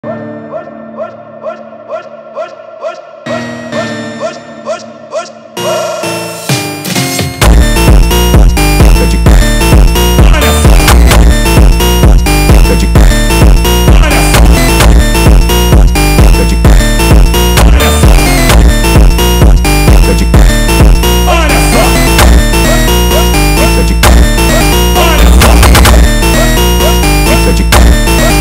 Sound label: Phonk